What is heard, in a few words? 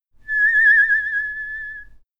vibrato